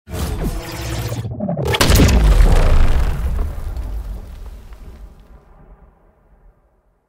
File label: zipp